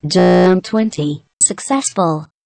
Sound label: Jump